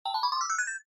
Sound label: Scene Change Slow Short